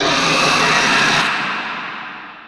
inside battle antlion, prison; Half Life Model Pack; Half Life 2; ambient levels

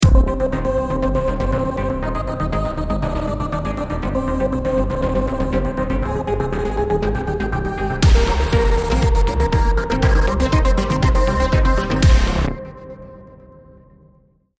start action

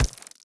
step layer, headcrab black movement

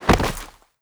body impact; physics

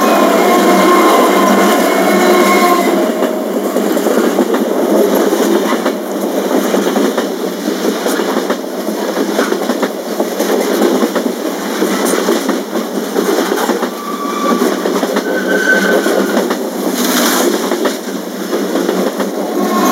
train freight loop

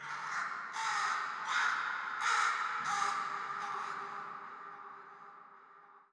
crow, ambient, nature, birds; Portal 2

